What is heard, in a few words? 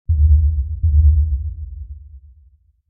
Impact Sub Tension Drum Hit - Double; Hollywood Edge; sound designer's toolkit v2